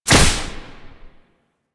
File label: Morph Impact; Warframe; Warframes; Khora